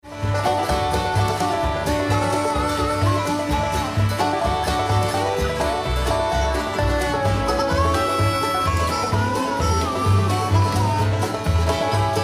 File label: bluegrass; sandiego